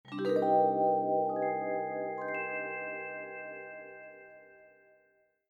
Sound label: Item Drop